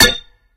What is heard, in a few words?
Metal Pipe Hit; angry birds